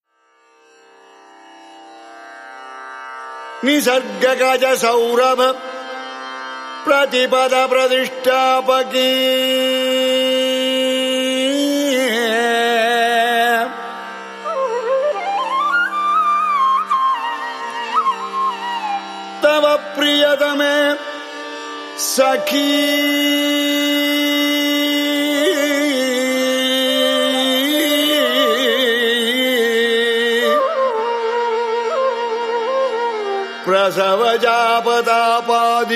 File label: shivashtapadi ashtapadi ritigaula sloka; sanskrit